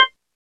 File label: Organs, Org-Ham; Club-50 - Foundations Roland